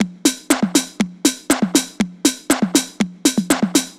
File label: Combo Hat